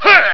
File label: hoough, human